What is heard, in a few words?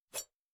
woosh